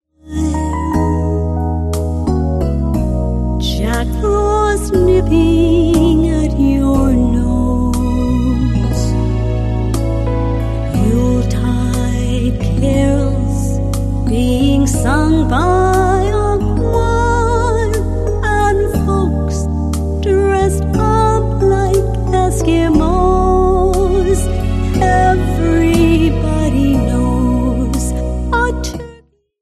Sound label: jazz and jingles